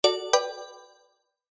multimedia alert notification mallet musical short positive attention